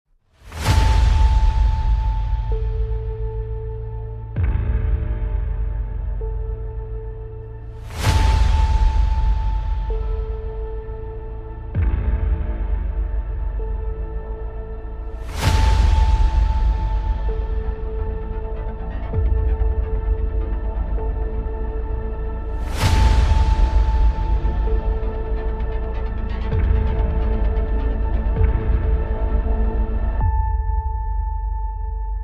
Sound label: trailer Music